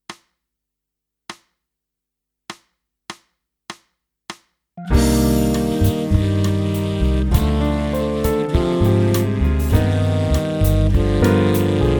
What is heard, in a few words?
Duett Tenor